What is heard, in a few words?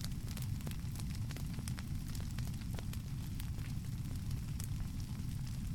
smoldering fire loop; zvuki kostra